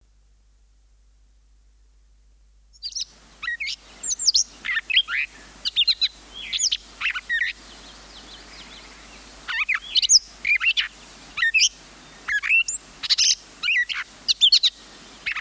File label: gray catbird, songbirds